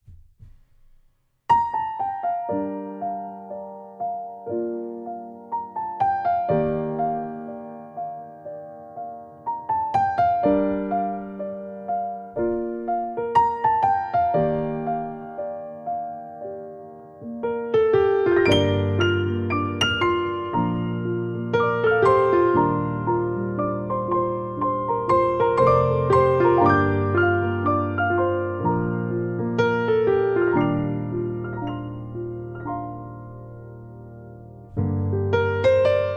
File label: Piano Version